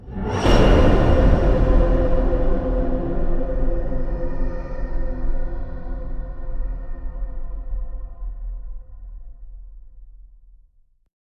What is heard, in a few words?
Friend Left Game; Dungeon Hunter 2